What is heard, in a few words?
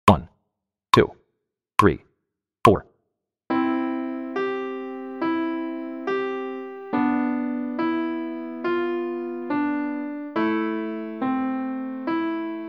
Snow Dancers 70 BPM